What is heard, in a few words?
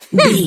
voice female effort jump action